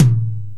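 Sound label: DR550 Tom Mid, Boss DR-550 Drum Machines; 300 Drum Machines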